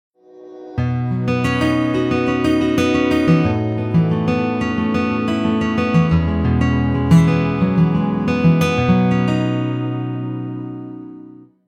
Clock alert, phone tone; Nokia; 2700 classic

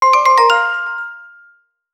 Dazzle Alert; Sony Ericsson; Xperia X1; PlusFourSix; Venus; Lars Edvall

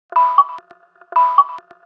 alert; Nokia; 8800

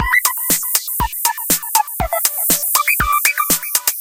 Curve ball blend; Samsung Galaxy S

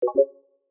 Space slide open; Nokia; Audio themes

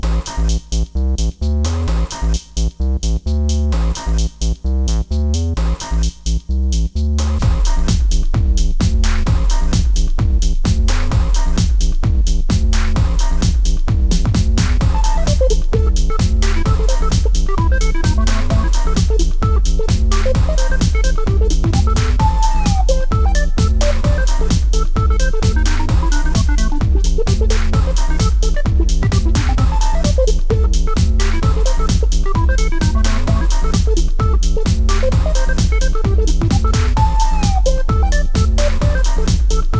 Elec; Google; NewWave Labs

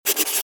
Key Tone

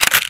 camera click continue; Fujitsu Arrows-X-LTE-F-05D

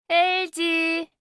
voice sound; LG V20

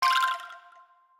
Safari open; Nokia; Audio themes

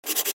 Key Tone

